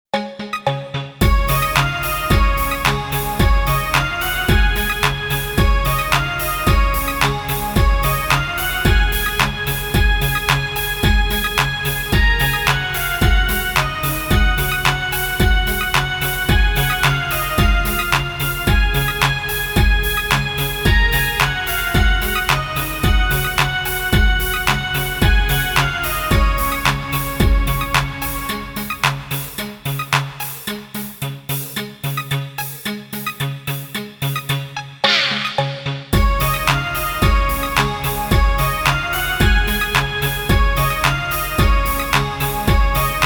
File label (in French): VERSION KARAOKE; Alex et Zoé 1